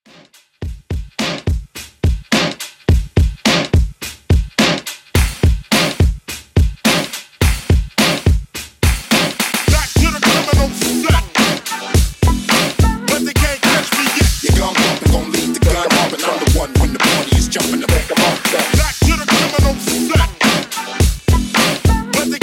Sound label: Old School Redrum 106Bpm